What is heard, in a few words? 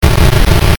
retro; maoudamashii